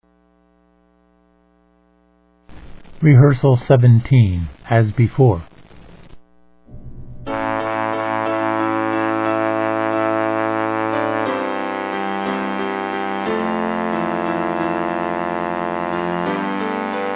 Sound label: cecilia bass